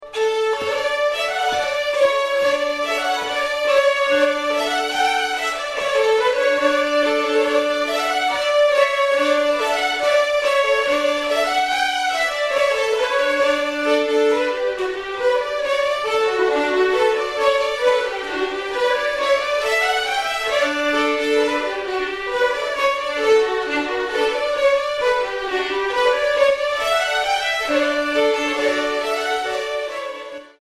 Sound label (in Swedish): engelska